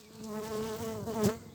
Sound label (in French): Abeille zzZT; Vols Bourdn abeilles